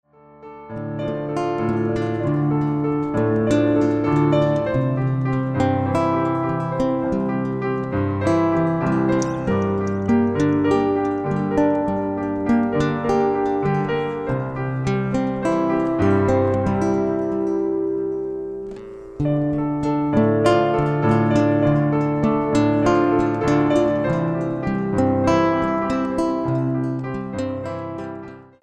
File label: Panis Angelicus instrumental